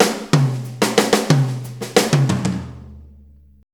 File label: 90 BREAK, DRUMS